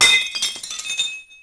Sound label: Glass; Urban Chaos